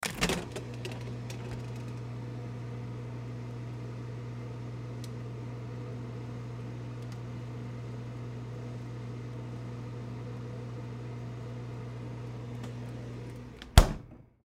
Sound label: Freezer Open Close, Freezer Open Close Ice Box; Appliance; Kitchen; Household